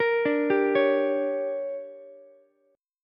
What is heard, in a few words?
D Major 7th Suspended 4th Sharp 5th, Ukulele; DM7sus4#5; arpeggiated; JGuitar